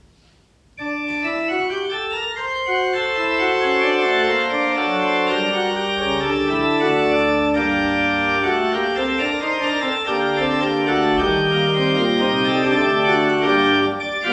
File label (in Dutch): mixtuur; assen